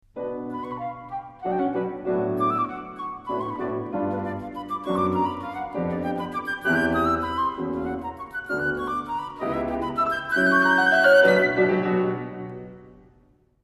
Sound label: Flute Sonata Excerpt; Reinecke